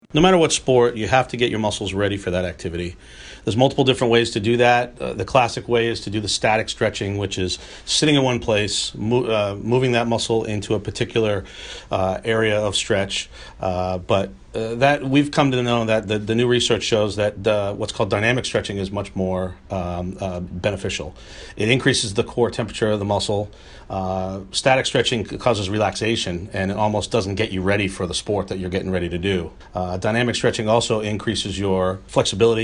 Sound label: Warm Ups